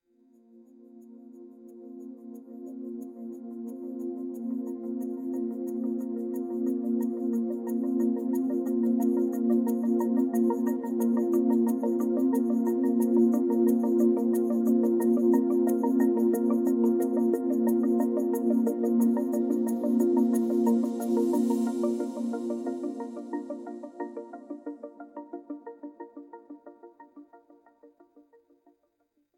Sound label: dreamy electronic pad